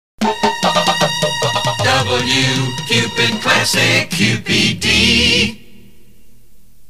jingle Cupid Classic; WQPD

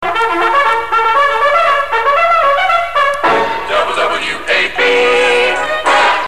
jingle; WWAB

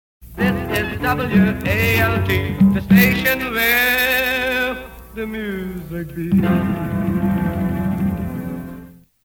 early jingle; Lost Prod Trax; ca.1957

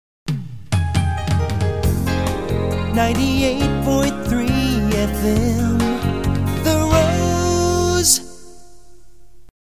jingle; WWRZ

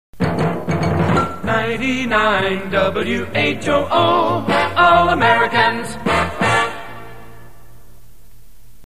jingle; 99 WHOO All Americans

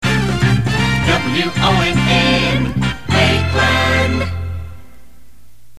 jingle ID; WONN

